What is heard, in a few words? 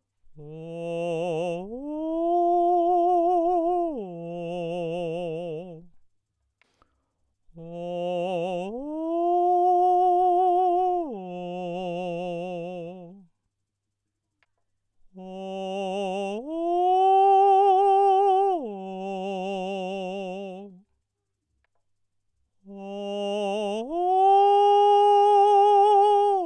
Falsetto